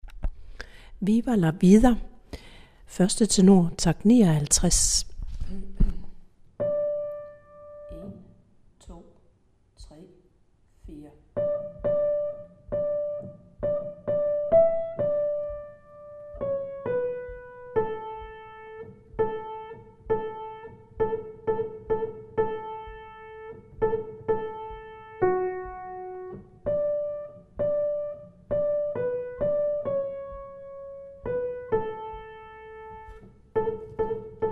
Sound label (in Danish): 1. tenor